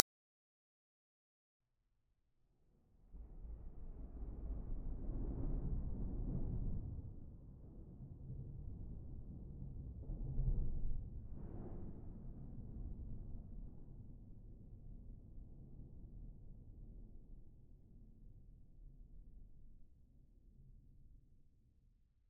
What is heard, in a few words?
Ambiance Random